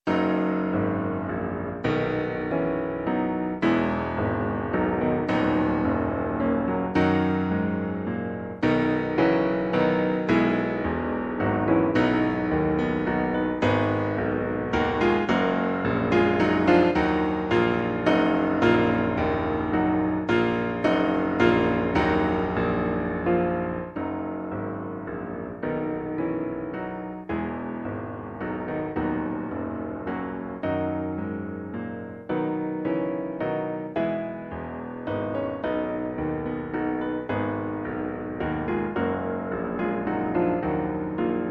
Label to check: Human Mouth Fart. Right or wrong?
Wrong